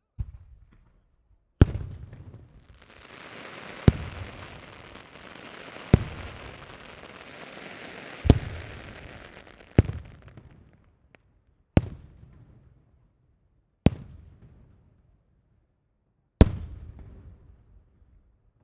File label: Strong blast with sparkle, Fireworks Malta; Sound Villain